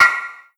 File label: Snare Rail, 808 Snares; Slate; Vintage Drum Machines Volume 2 808 Edition